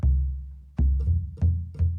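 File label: Calm Far, music; from dust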